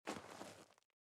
step gravel

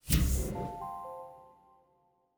Doors Down; PCBS; Aorus

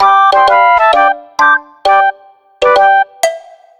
Jingles Gag

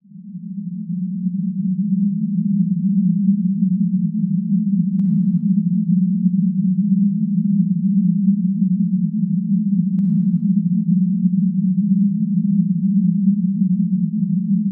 Deep Rumble; Jolly

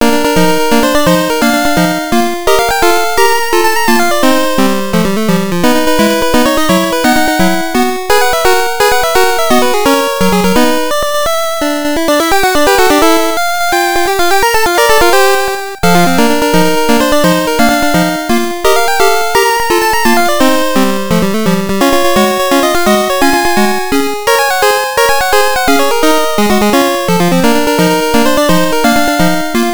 Main Music; The Tower of Druaga; GB

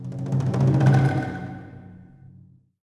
japan drumroll; Hellboy the Science of Evil; ps3

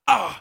Voices male pain shout

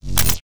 angry

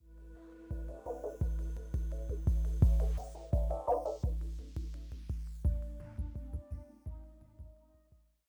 85bpm; Legends of Pegasus; windows gamerip 2012

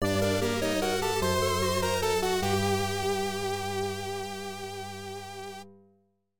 Battle Defeat; Outing 6; CUTOUT Helo Helo; virgin dream pc-98 1994

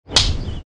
Slap Sound; Dude Theft War; Android

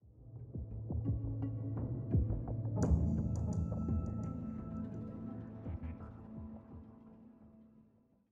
85bpm; Legends of Pegasus; windows gamerip 2012

